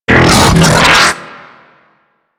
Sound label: Beam Attack; Warframe; Ambulas